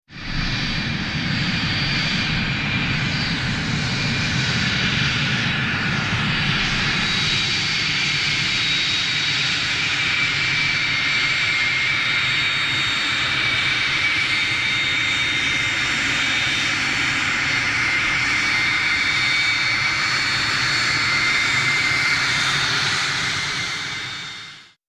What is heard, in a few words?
Cessna Citation Tax